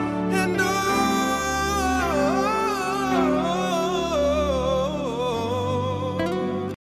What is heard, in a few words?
22 notes